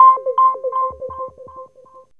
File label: ANALOG ECHO; Zero-G Ambient 1